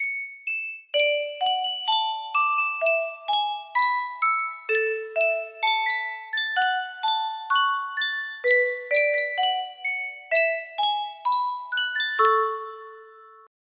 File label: sankyo custom tune music box